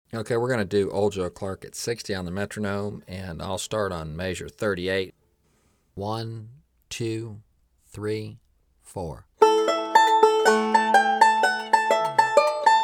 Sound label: Old Joe Clark Break 3 1st Half, 60 Bpm